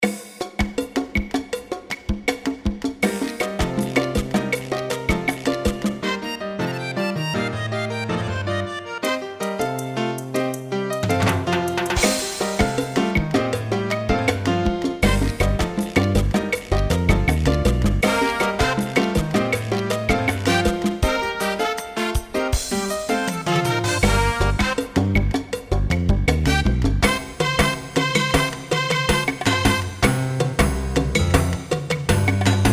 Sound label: salsa